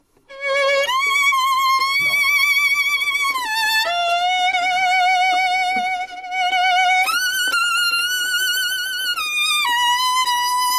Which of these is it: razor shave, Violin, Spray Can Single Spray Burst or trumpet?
Violin